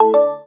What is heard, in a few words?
kling; speech; tts; bmw